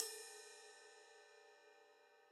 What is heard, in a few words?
ride, gretsch kit